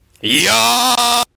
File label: yauuuuuugh